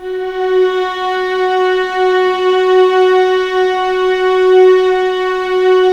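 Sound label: F#2 legato mezzo forte violas, string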